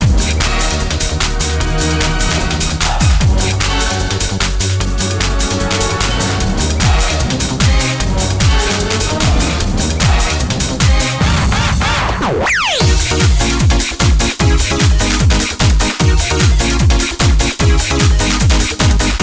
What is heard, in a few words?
Orchestra Groove; DDRMAX Dance Dance Revolution; PS2 US